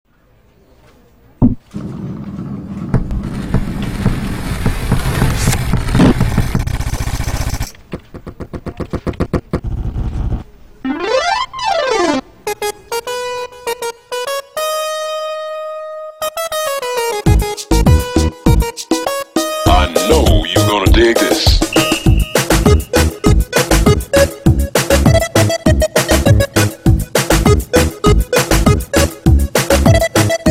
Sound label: MIDI Keyboard